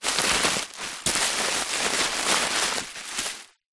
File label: office bubble wrap sheet movements